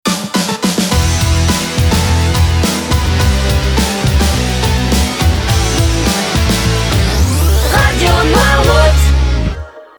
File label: Jingle rock